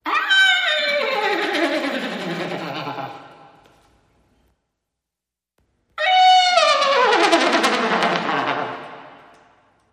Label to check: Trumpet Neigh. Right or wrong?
Right